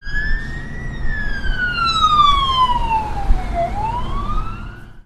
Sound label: Cop Car Siren